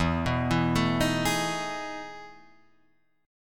E Major 11th E down; EM11 arpeggiated; JGuitar

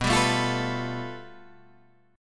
A 7th Suspended 2nd Sharp 5th

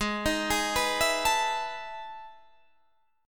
E Major 11th chord

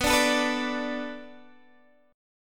B Minor 7th B Guitar DADGAD, chord

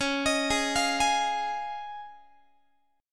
C sharp Major Add 9th, Guitar; C#add9; arpeggiated

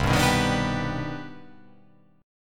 C Minor Major 9th C

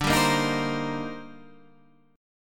D sharp Major 13th, D, guitar chord

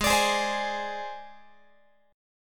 Ab Major Flat 5th Ab Guitar; AbMb5 strummed; x,16,17,18,16,19

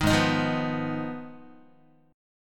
Ab Minor 6th, Ab, Guitar; strummed; JGuitar

